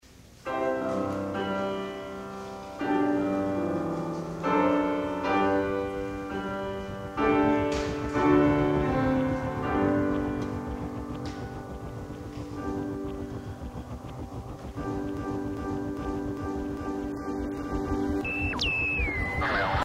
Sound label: Wedding Piano Intro; Audio Book